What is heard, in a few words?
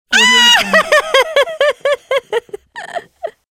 female excited laughter